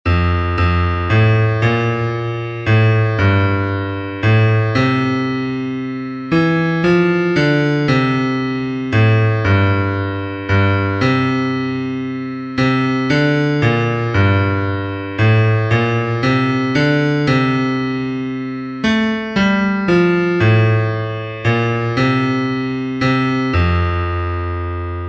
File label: Bass